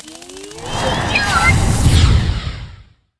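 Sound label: moth attack, monster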